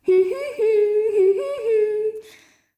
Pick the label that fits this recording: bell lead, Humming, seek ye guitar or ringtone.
Humming